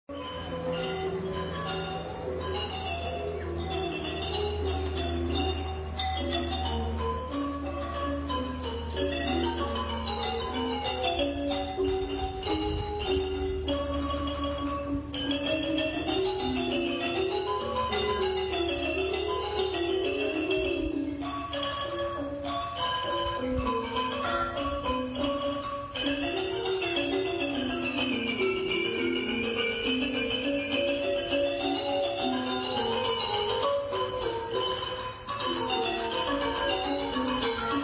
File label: Traditional Thai Music